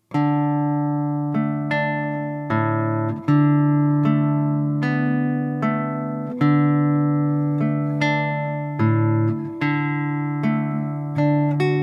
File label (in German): Samba für zwei Gitarren Duo